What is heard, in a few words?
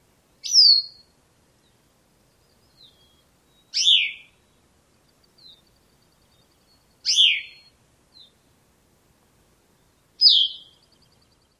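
Bicknell's Thrush calls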